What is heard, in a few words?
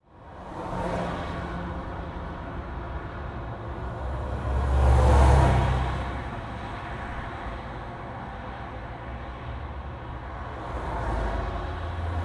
Car interior, Traffic